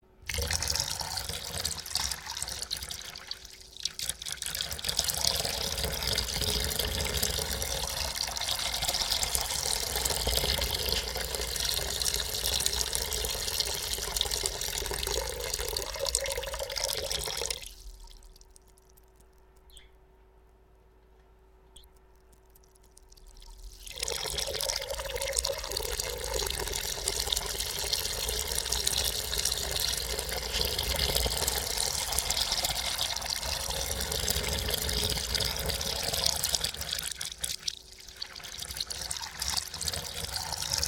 water reverse

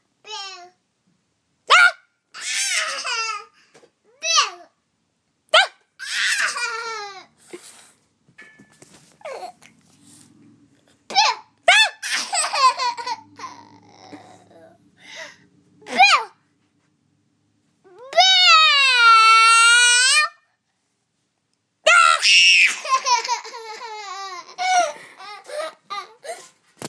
we scream

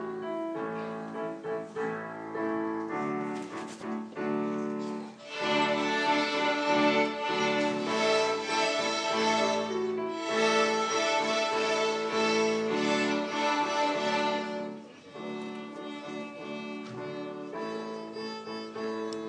Y3 violins

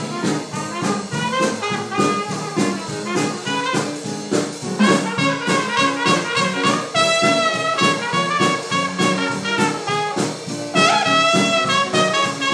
jazz band; syncopaters; box hill

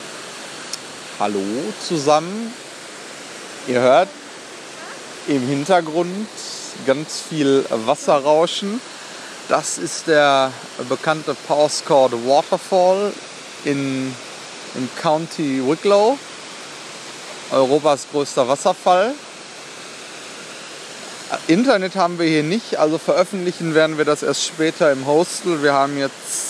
powerscourt waterfall

